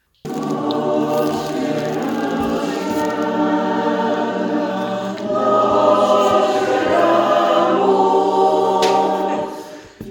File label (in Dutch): El Nacimiento Tutti